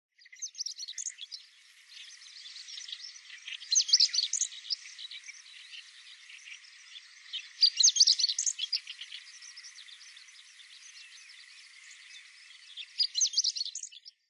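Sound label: Chestnut-eared Bunting, nasu; hooaka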